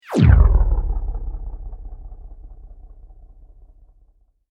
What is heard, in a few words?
Sweep Mechanical Medium